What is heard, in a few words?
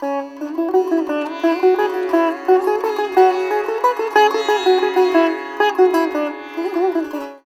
SITAR; Spectrasonics; Heart of Asia